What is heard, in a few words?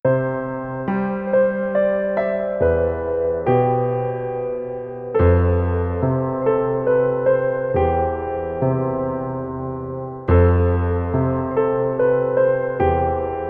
piano